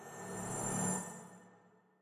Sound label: scatter Sym Anim Start; goldengrimoire